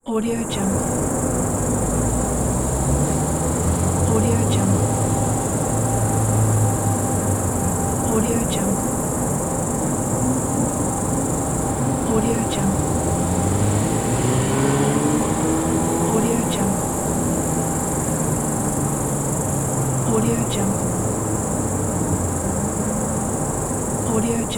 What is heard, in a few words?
cicadas and cars in the city